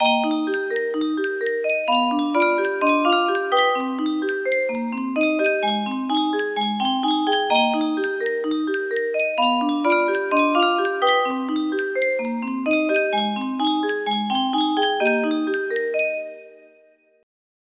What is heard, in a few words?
music box, music box melody